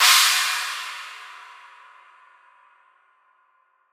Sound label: Crash; Hats and Cymbals